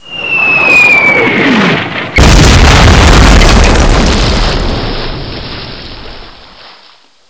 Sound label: bomb hit, ambience; dod